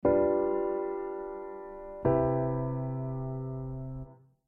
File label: G7 Cmaj7